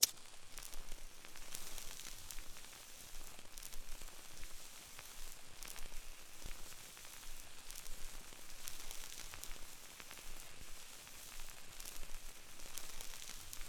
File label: Sizzle Lighter Strike Flame